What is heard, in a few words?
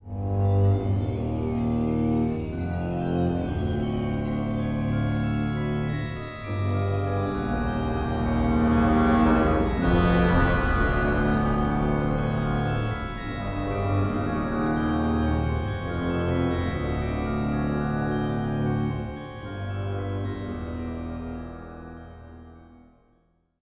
suspense, music